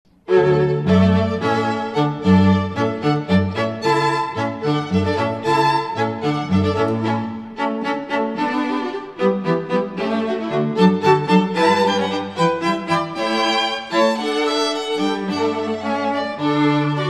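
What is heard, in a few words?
hornpipe, guitar violin; classical quartet; Vogue Music Events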